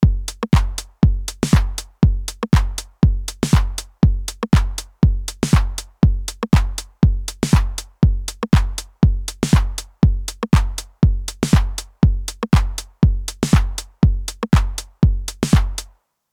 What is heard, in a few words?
AMS dmx 80S 909